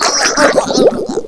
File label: DROWN; openffa; astro